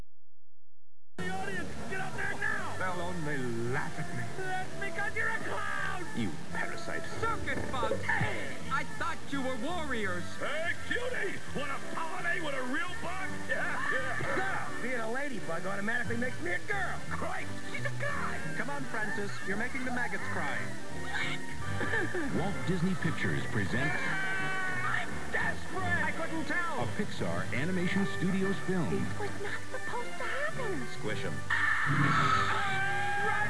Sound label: laugh